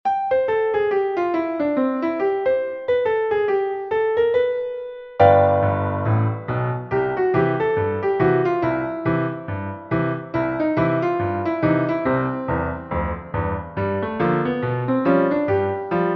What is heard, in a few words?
RAGTIME